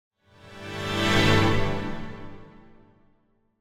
Symphonic Hit Short Strings Warning Calls; Hollywood Edge; sound designer's toolkit v2

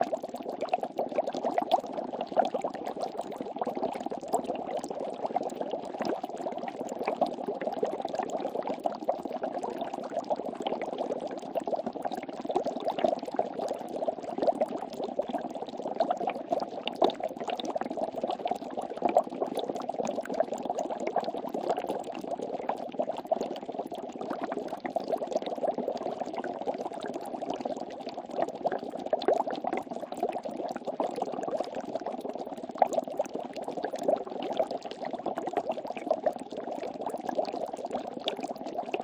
stove bubble; DeadFright

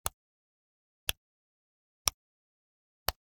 Generic Click Plastic Tiny; boom library; The Interface

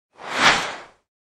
whoosh swish; NCH Library

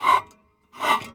squeal; best service Blue Box; mixed sounds short

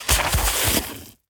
Bodyfall Concrete; SFX Kit; Bodyfalls

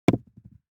DICE on Felt, Throw and Roll, Metal, 2 Two Dice; Samples; ArticulatedSounds; DICE Sfx Party (Extended)

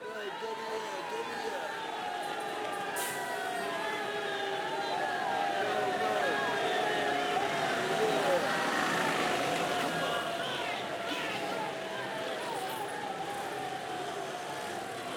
Crowd Noises Jeering; Pitch Black Audio Pong

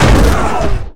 Football Hit Tackle

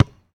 Street Ball Bounce Dirt, Street Basketball

